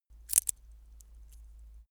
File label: metal watchstrap click